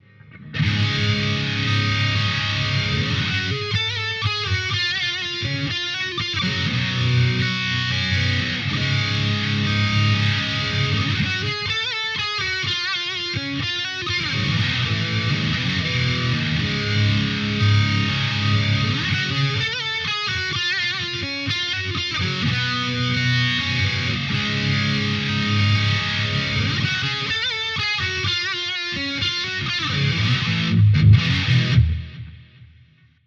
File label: damage control slo od